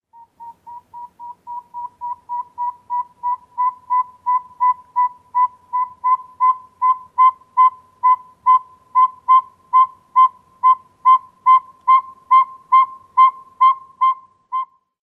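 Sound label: toot series, bird; NY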